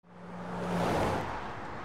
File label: car driving